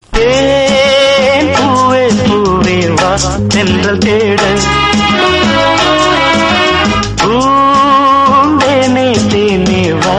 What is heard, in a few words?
Tamil Song